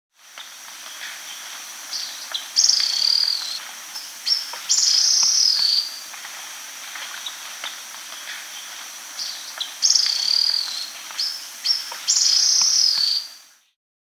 Spectacled Bristle-Tyrant (Phylloscartes Orbitalis), Andean Birds; S. America